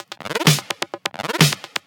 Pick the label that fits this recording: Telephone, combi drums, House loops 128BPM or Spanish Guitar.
House loops 128BPM